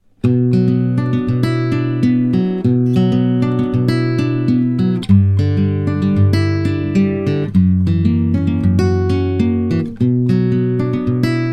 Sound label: Fingers natural